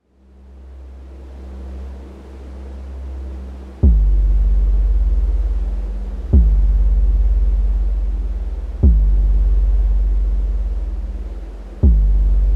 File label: sea drum circle